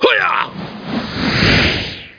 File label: whirl; sw demo